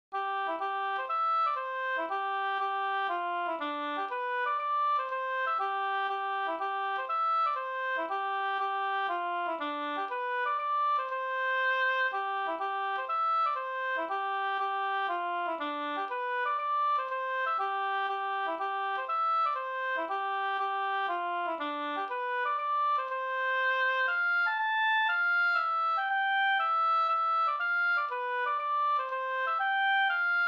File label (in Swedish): polska Lillpolska